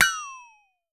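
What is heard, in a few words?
LARGE FLEX-, FLEX-A-TONE; Voice Crystal - Steve Reid Definitive Percussion; ERIE FILM-Q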